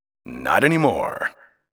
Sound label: collect pod